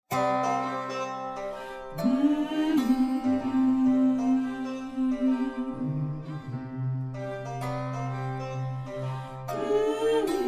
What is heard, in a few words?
Soprano SLOWER; choir